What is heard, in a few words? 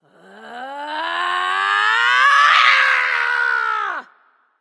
battlecry; smite; aphrodite